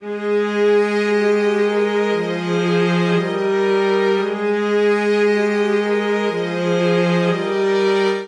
Phrase Hopeful Ab Lydian 116 bpm, String Quartet; MIDI Clips; Ableton; Factory Packs; Spitfire Audio

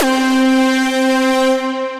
Pluck pad, Synth Lead; Ableton; Factory Packs; Build and Drop

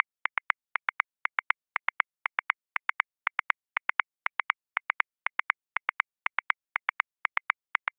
Classic Disco, Drum Booth, Grooves; Ableton

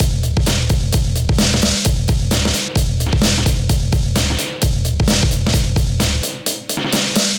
HipHop Beat 85bpm, MIDI Drum; Ableton; Factory Packs

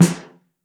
SN2 Mono, Snare; Ableton Foundation; Snare Designer

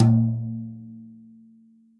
FLOORTOM JAZZ brush off center

